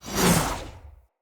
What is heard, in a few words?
Knight Axe Whoosh; Weapons; Enemy; Axes; Snc Knight Axe